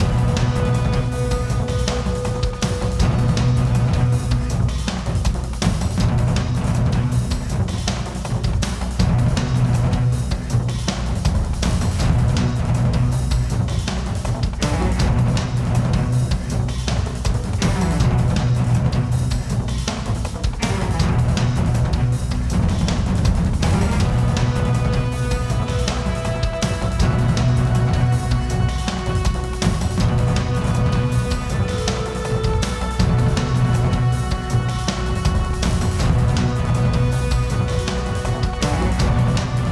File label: HQ Attack Music; Clandestine